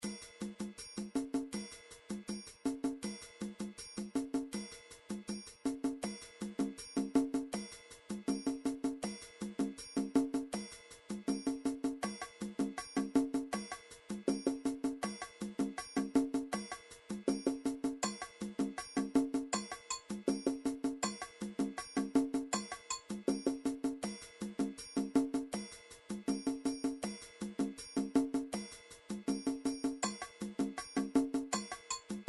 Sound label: drums fanga